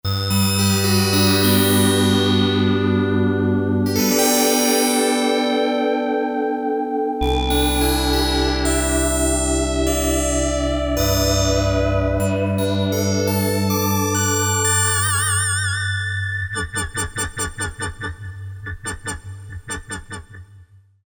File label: AaaHhhhh, Korg DSS-1; Brass